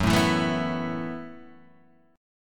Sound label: Gb Minor 11th Gb down Guitar Half Step Down